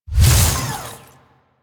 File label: Mech Melee Swing; Nechro